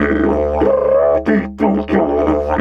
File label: D 93 bpm, didgeridoo; Big Fish Audio - Didgeridoo and Other Primitive Instruments